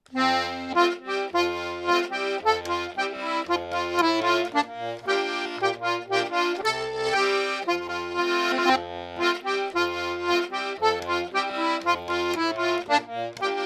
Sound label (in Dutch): Carrion Crow gespeeld, diatonisch